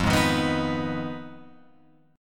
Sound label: F Minor 7th Flat 5th, Guitar, Open F; strummed; JGuitar